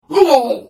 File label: Hit; The Elder Scrolls III - Morrowind